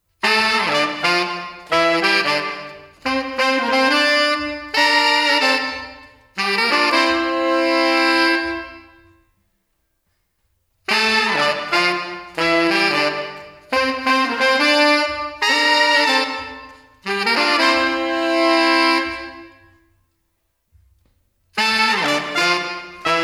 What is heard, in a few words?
Horn Section LXP Plate